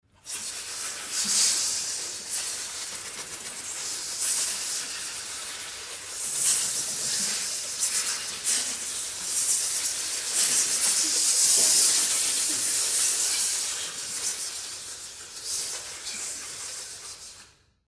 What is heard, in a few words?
scream; Hissing / Hannibal Lecter!; RSVP VOICES; Audio Network